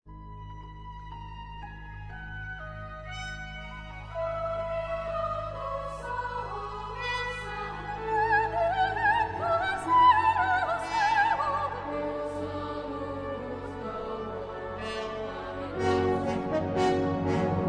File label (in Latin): Missa brevis Fis dur